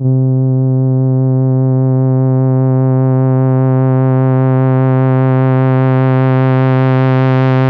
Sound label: SL SWELL C2, SYN STR